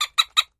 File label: Rubber Toy Squeaks, toy squeak